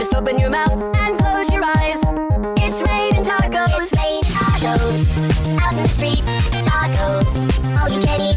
goal fast

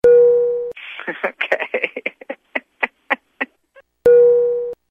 ok laughing

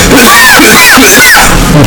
cough

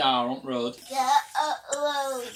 car on road

